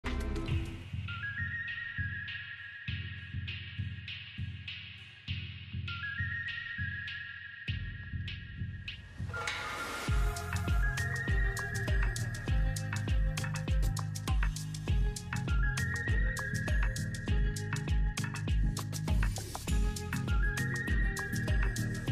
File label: Soundtrack Default Vibe Lobby Music; Ritmo Predeterminado